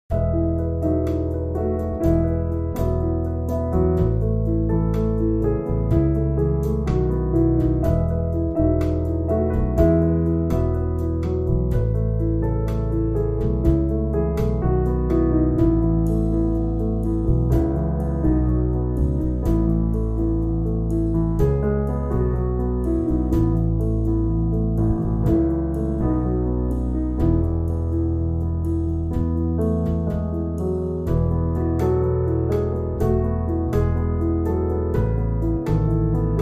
Trio; 60sec

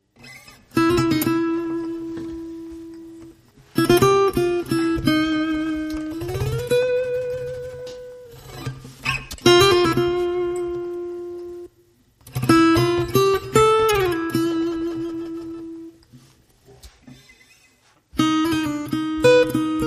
Lead Guitar Only

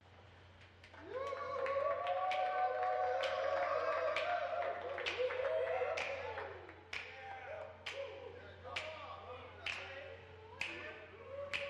Jazz Club

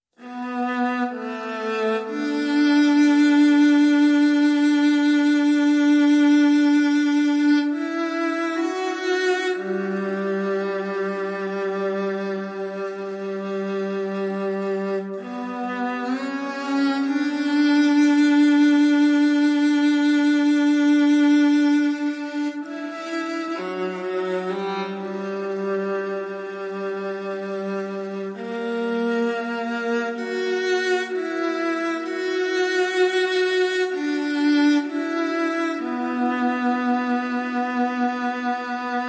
Strings Only

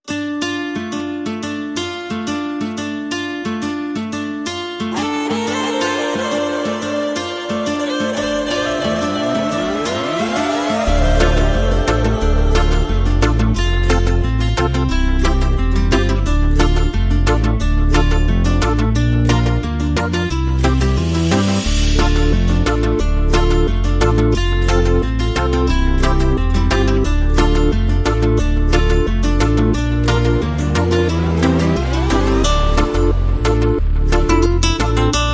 No Perc

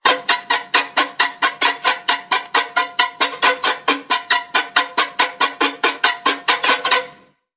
Psychotic Plucks

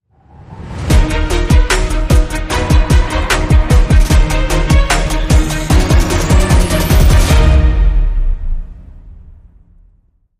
Hybrid Sting, Short

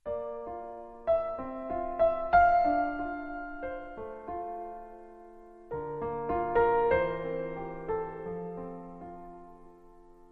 Piano Solo

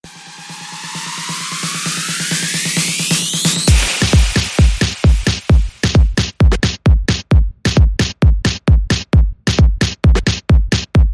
Beat

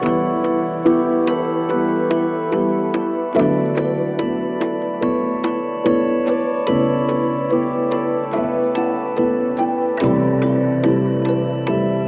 Sitar And Tea, Link Sting

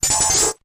Thin Piercing Zap

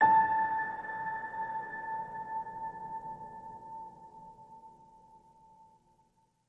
Piano Ping A

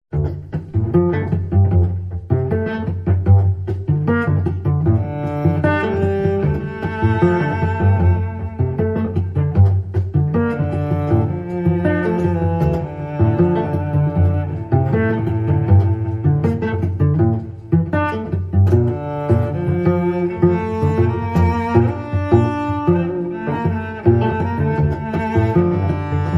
Cello Suite 4

